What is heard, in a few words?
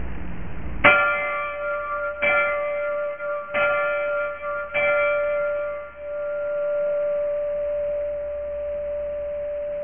bell